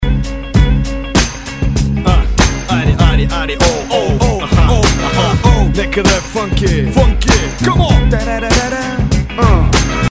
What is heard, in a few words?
win, music